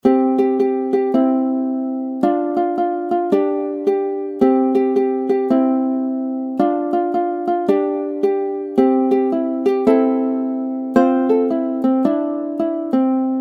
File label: ukulele; pieski male dwa